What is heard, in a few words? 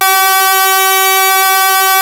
ARP 2600 D5, synthesizer; Hollywood Edge - Giorgio Moroder Rare Synthesizer Collection